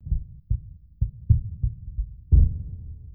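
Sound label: background combat; soundscapes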